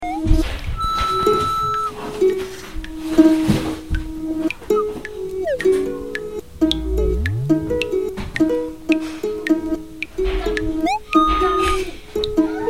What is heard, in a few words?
stringed